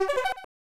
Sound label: Pause Jingle; pro mahjong kiwame; snes